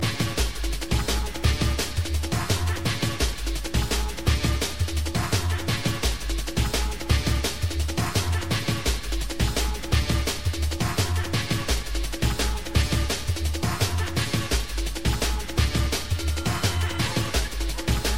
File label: Drum roll